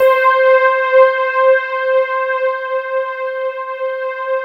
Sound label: JV HUFF N ST; Syntec; Wall of Sounds